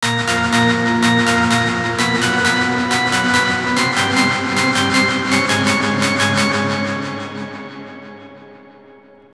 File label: Str G#